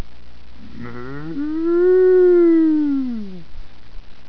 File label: eat moo; hall of moos